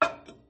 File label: Cooking